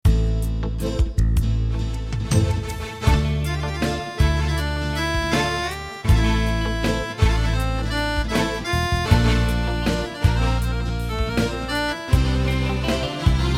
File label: 16 Beat Turk